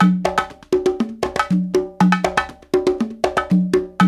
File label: World Dance; Spectrasonics - Supreme Beats; Compasa